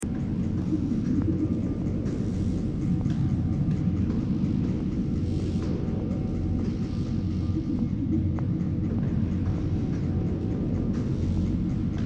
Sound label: interior; Kamino